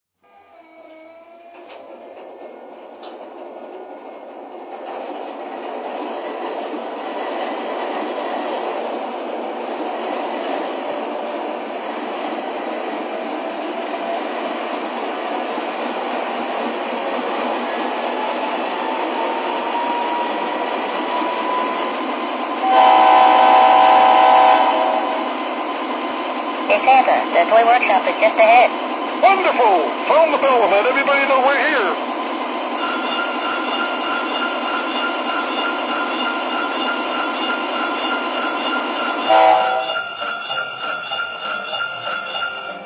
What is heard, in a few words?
es44ac, electric trains